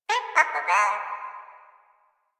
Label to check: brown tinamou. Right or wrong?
Wrong